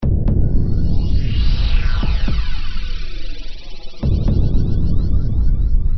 entry; Incredible-Ninja

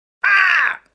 ouch; Jungle-War

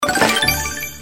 match; candy match3

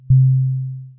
timer; Knights-Diamond

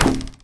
stuck arrow; Forest-warrior

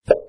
click; flappy bounce

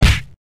touch wheel; cowboyrun

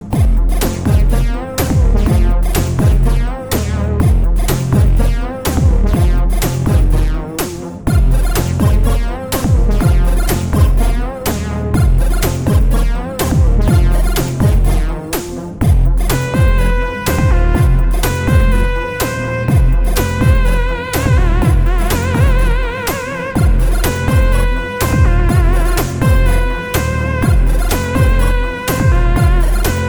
gamemusic; brickout

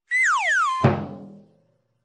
player death; Monkey Banana Jump

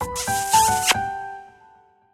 winning kiss; Maze-Lover

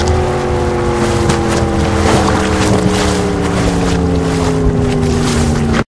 engine reverse; Waterraft

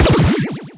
heart sound; Cupid-Heart

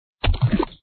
bomb; fishingfrenzy

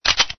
no ammo; cowboyrun

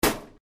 shot; duckshooter

